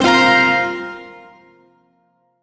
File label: Shawzin Hirajoshi Chord; Warframe; Duviri